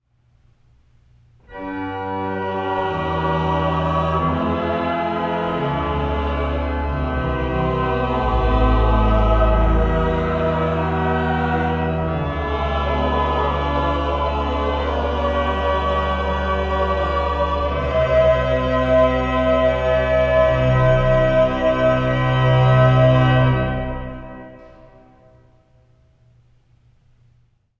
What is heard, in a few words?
Great Amen